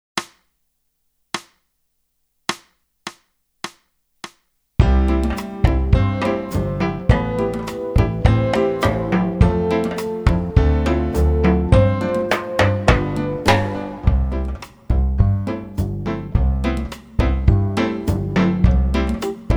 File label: Rumba